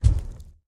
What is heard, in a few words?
Giant Stomp; Lethal Company; Windows